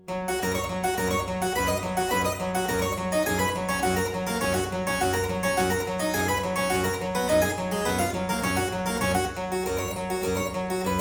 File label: Aria in G major Partita 23, Harpsichord